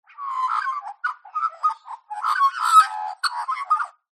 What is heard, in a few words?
animals bird australian magpie call